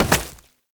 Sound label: Step Vegetation, Foley; Warframe; Oraxia